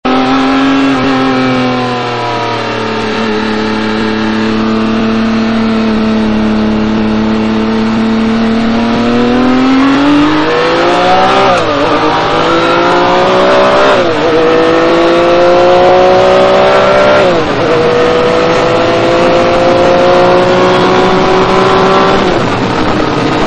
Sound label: Ferrari F50 koenig acceleration